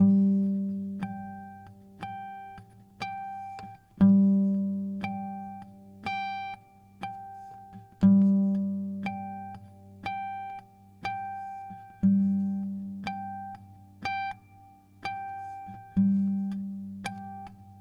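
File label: Gm 120 guitar harmonics; GTR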